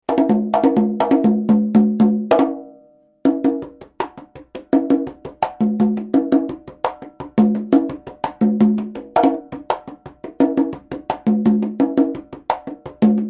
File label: conga tumbadora sound